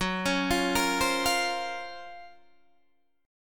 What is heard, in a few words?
Gb Major Sharp 11th, Guitar Standard; GbM#11 arpeggiated